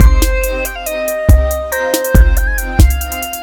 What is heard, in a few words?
victory music; smite; kuzenbo